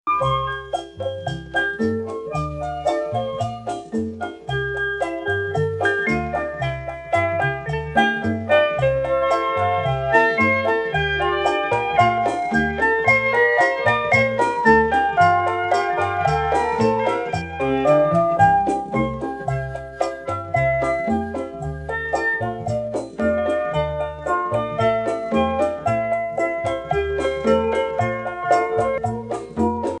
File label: jazz, Chinese Style Jazz; suno